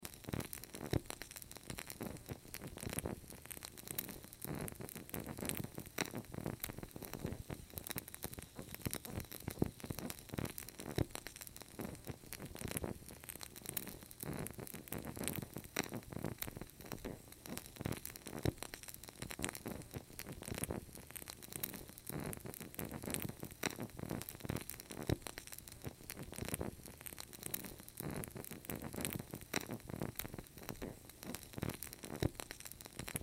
Candle Flicker Flame Crackle Loop; Flames; Objects; Flame Sound Effects

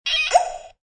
Funny Toy Sound; Funny little cartoon sound.; Children

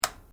Switch Ocillascope Flip Click; Mechanical switches; Electrical Buttons; Multimedia

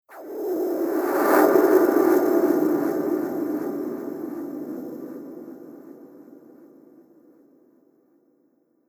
Magic Surreal Soundscape; Andrew Fleming

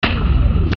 Hit Low Descend, Hit Low Descend Zip; Production Element Imaging Element Accent Transition; Bass Button Sound Effects; Blastwave

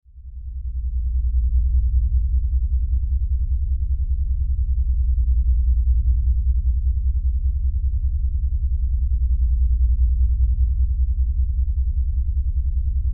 Science Fiction Power Drone Spaceship Hallway; Sound Design

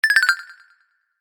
Digital Chime Alert Time Left; Digital Clicks, Blips & Alerts

